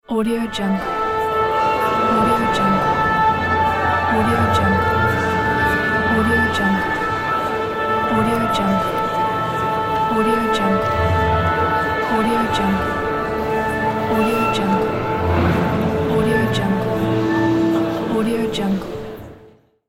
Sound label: orchestra